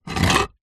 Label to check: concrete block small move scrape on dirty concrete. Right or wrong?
Right